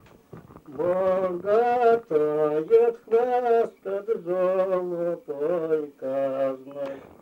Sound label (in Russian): Былины; Мудрой-от похвастат старой матушкой; мезень; Речевой портрет; А уж глупой-от хвастат молодой(и) женой